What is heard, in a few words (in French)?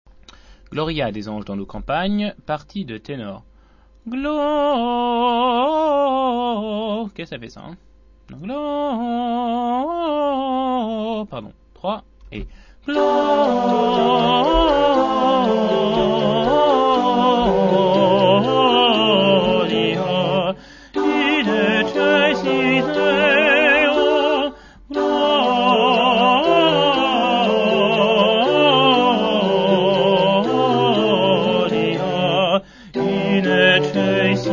Gloria anges campagnes tenor